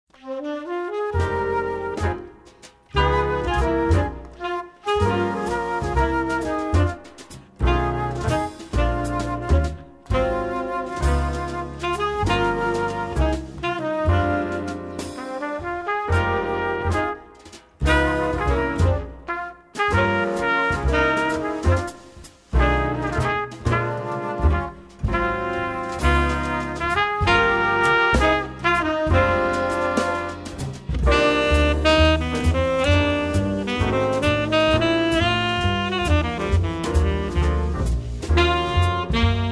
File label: funk